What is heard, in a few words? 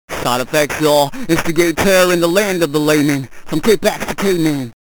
bit-crusher